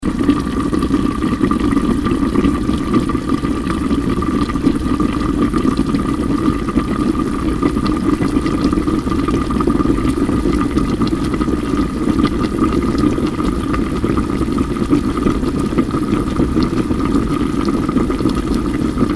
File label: Simmer